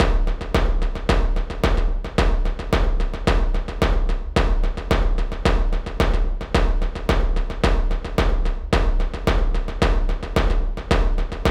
Bassdrum - 110BPM; Percussion Kits; STEMS; Ghosthack; SkyRealm; Kit 03 - Border Patrol

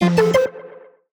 Retro FX; Cymatics; Video Game Sample Pack Vol 1

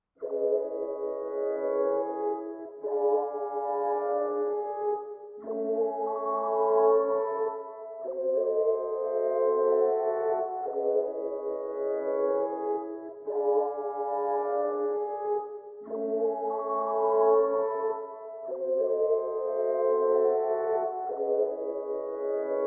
92 BPM C# Min Pad; Loop Stems; Cymatics; Ballad

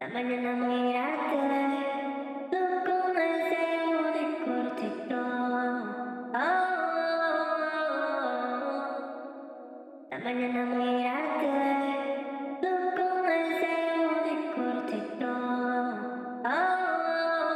95 BPM B Min Vocal, Latin; Melodies; Loop Stems; Cymatics; GHOST (Beta Pack); Aventador

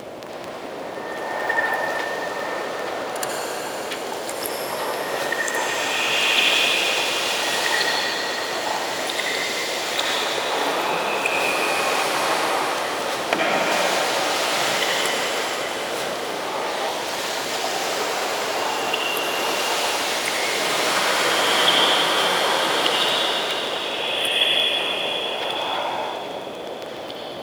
Trap Ambience, Background Ambience

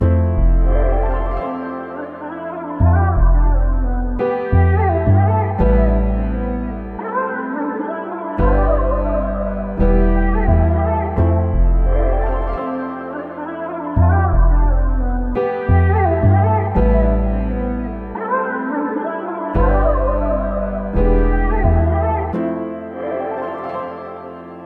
172 BPM A Min, Melodies; Cymatics; 2022 Melody Mystery Expansion

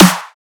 Riddim Snare

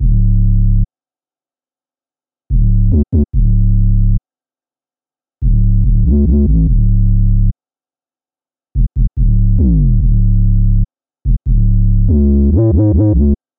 144 BPM C# Min 808, Drill; Loop Stems; Cymatics; Warning; 2022 Melody Collection

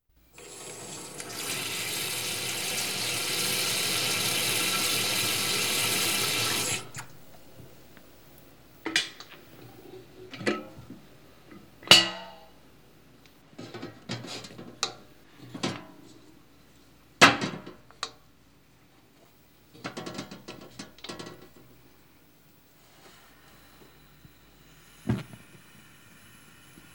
Kettle Boiling Sequence; Home & Office

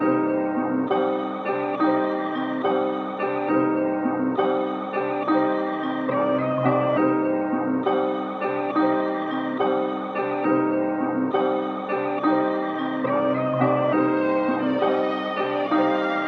138 BPM C Min, Melody, Trap; Cymatics; 2022 Melody Collection; Trap Pt 2

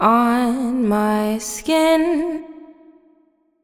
70BPM, Female Vocals, Wet; On My Skin; Ghosthack Advent Calendar 2017 Day 1

